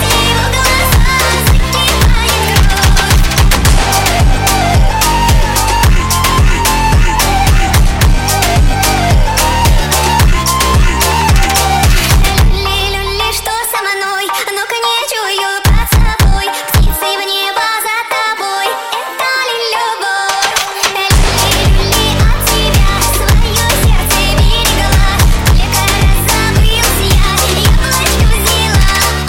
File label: Funk